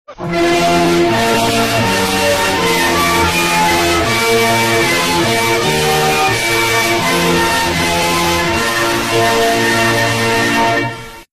anthem bass boosted; dutch

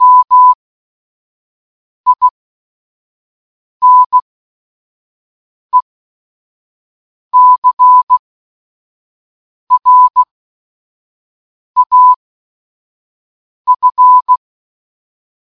morsecode; escaperoom 19; minecraft

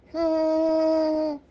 hum

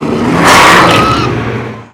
rathalos rathian snarl

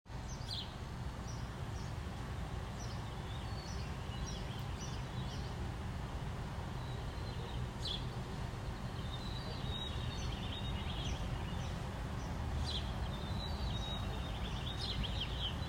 birds

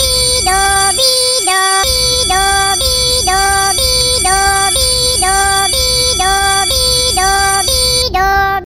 fire alarm; minion

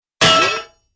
clang and bee woomp

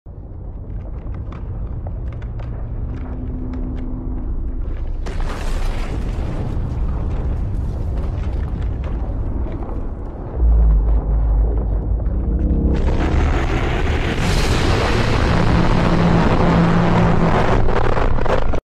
roar; godzilla earth; anime godzilla roar

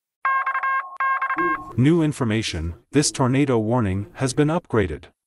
tornado warning; ryan hall yall

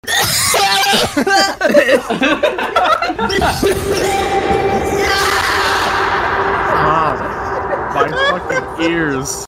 sneezes; skulker; therussianbadger

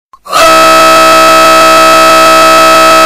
death error; shaco

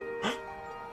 gasp; grinch

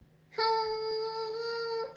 long humm

